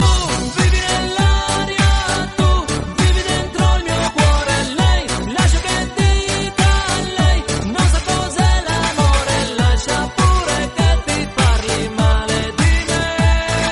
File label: Italian music tone ringtone